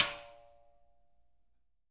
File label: snare rim, JZ XSNARES; Sampleheads; Peter Erskine Living Drums